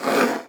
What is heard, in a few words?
Hard Slide Short